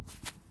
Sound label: grass, Footsteps